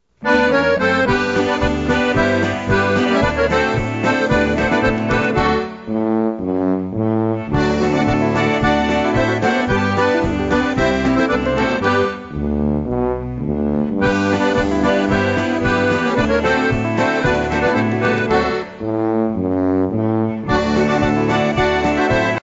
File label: TUBA POLKA